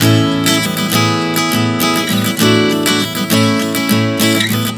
Progression 100 A-E-D-A, Acoustic Guitar, Chord Progressions 100 bpm